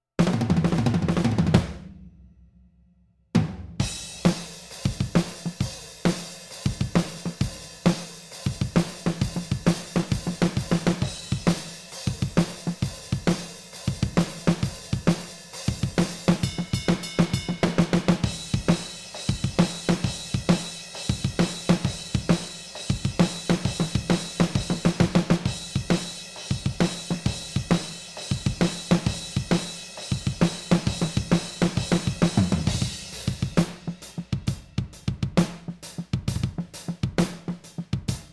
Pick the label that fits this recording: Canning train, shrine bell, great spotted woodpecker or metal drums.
metal drums